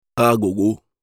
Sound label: Agogo